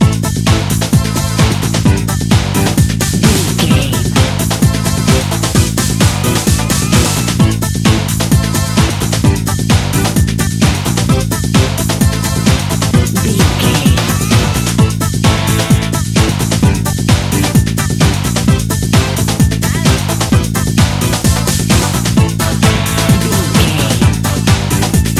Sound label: party end time, Dance, EDM, Club, Exercise, Aerobic, Aerobics, Spinning, Workout, Disco, Eurodance, Confident, Energetic